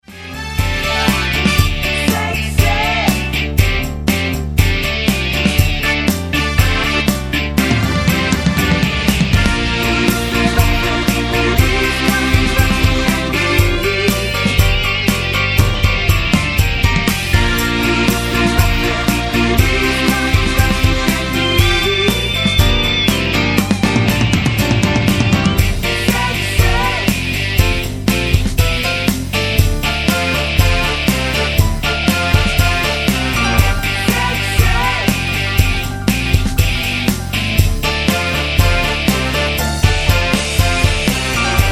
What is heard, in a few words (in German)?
mit Chor